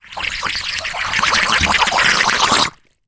foley balloon deflate release air lip in water bubbles; zapsplat